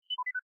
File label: Ticker Points; waze